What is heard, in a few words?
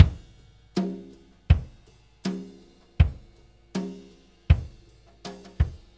Tom Groove, Laid Back; Sonic Foundry (Sony Creative Software); Loops & Samples Drum Tools